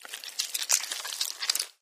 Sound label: worm; Sea snek; Barotrauma; Barotraumatic